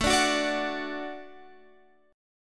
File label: A 6th Suspended 4th, chord